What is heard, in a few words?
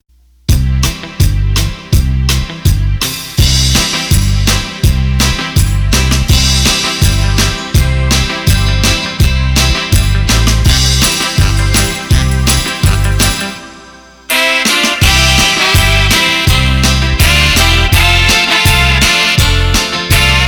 Country Up; roland bk7m